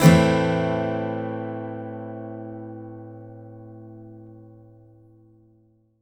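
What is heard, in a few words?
Acoustic guitar A minor up, Country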